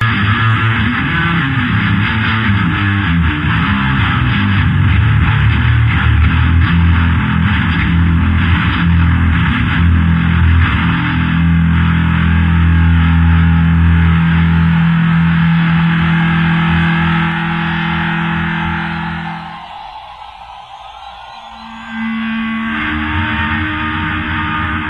Bass Solo

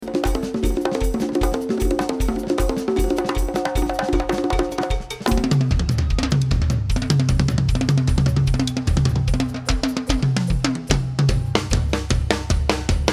Drums

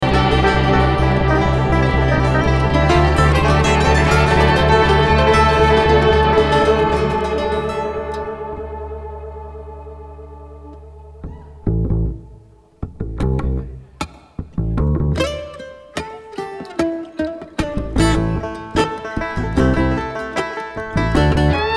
Traffic Jam

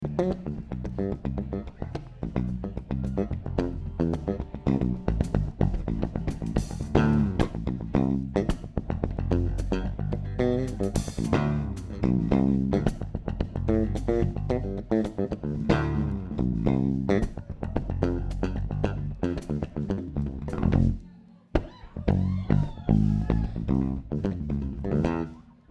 Bass Solo

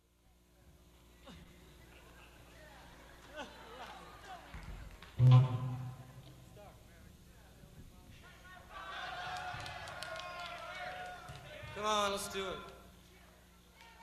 Tuning

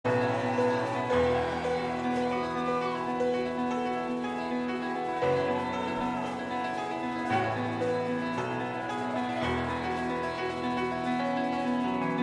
Piano Feature

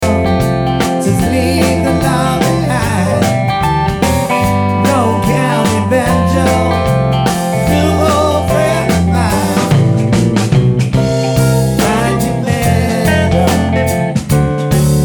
Banjo

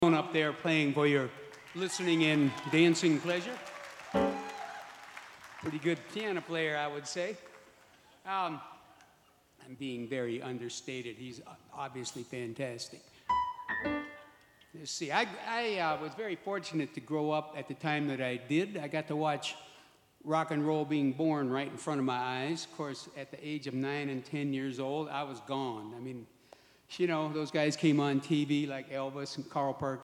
Piano Moment

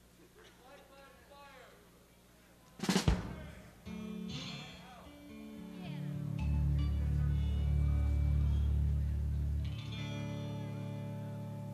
The Crowd Again Requests

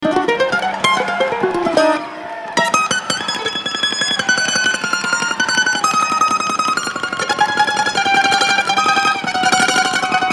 Kentucky Mandolin

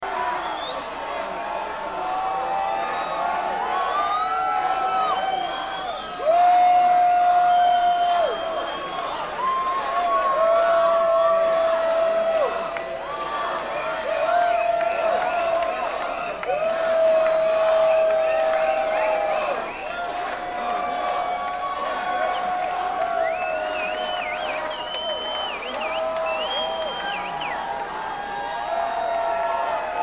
Crowd

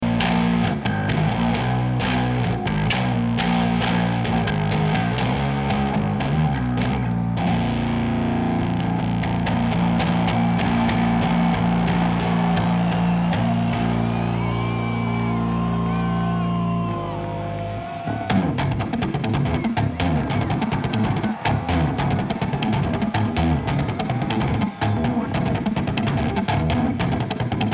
Bass Solo

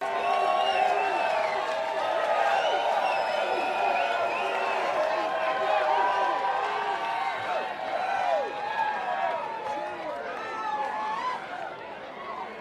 Crowd

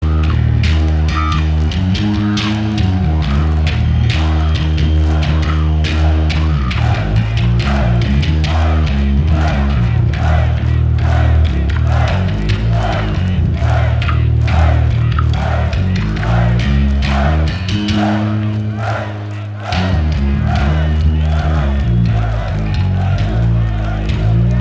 Bass Solo

